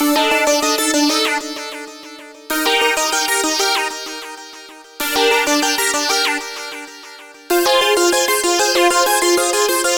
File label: synth arp; Electrocution